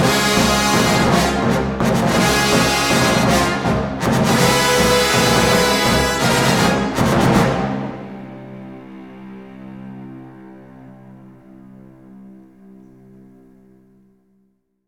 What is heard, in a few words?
Matchmaking Reveal Dark; lego star wars battles; Rebel Blockade Runner